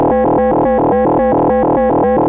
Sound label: Moog E2, Synthesizer; Hollywood Edge; Giorgio Moroder Rare Synthesizer Collection; MLTI.MOOG